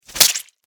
Extermination, Fly, Swatter, Hit, Squish, Insect, Bug; Sound Effects:Animals:Insects; Blastwave